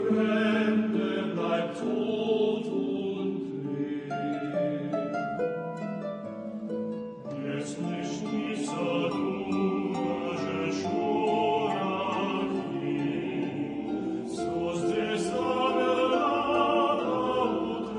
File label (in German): Chor